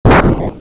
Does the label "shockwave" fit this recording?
Yes